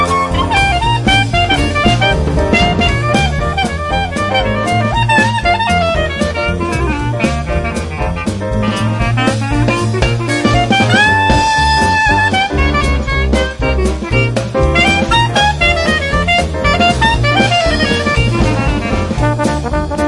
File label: weary blues; dixipedia